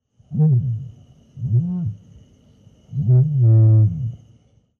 Strauss Struthio camelus, Vogelstimmen

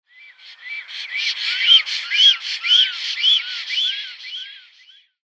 Schwarzstorch Ciconia nigra; Vogelstimmen

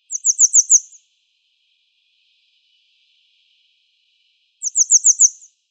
Gebirgsstelze Motacilla cinerea; Vogelstimmen

